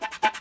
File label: chalking; PC Gamer IT CD 45